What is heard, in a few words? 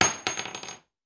Bullet Shell; Sound Ideas; Guns